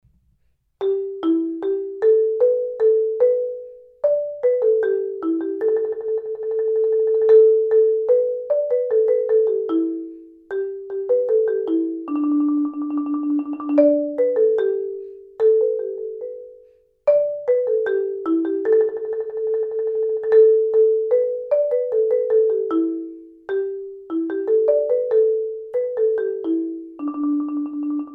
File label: mallets